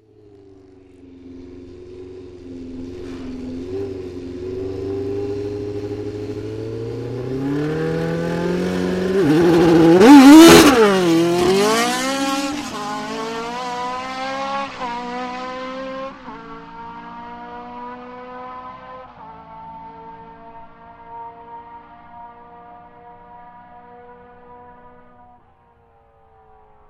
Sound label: F1 off start line